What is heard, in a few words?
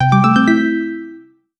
LogIn